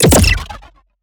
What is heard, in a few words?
Shot Small Blaster Pure Fusion